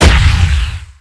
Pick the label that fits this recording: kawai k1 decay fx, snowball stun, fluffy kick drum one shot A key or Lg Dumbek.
snowball stun